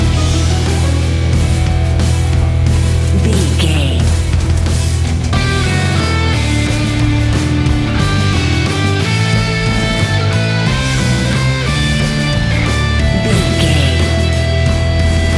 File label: epic heavy metal orchestra; Heavy Metal Orchestra 30 Sec; Catch 22 Music Network